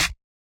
clap; goodtakimu drumkit; fl studio; drumkits

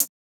hh, Hats; goodtakimu drumkit; fl studio; drumkits

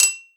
top mono perc; drumkits; percs; fl studio

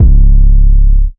808, C#; drumkits; fl studio; bvker drumkit; Born 808

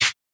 clap; goodtakimu drumkit; fl studio; drumkits

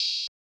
sizzle openhat; drumkits; openhats; fl studio

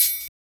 rnb hat; drumkits; fl studio; maily plugnb

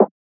Snare

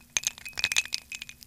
ice sfx; FL Studio; slayerx drumkit

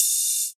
Ride, Cymbals; drumkits; fl studio; bvker drumkit

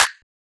clap; FL Studio; xander brown drumkit

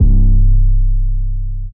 chopped 808; drumkits; fl studio; slayerx drumkit

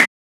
snare; goodtakimu drumkit; fl studio; drumkits

